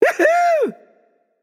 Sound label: nv yay; smite; xbalanque